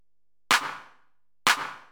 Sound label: PERCLOOP HOUSE 125; eJay